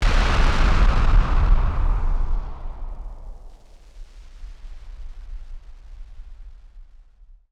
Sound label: Party Popper FX